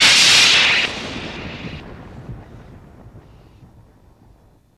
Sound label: Underwater Laser Fx